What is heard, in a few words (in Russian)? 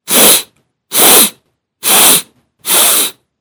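звук вдыхания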